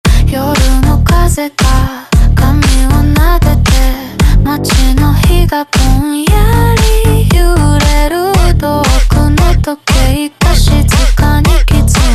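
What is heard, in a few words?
slowed Douyin Remix, phonk music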